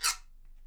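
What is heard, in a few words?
guiro, Percussion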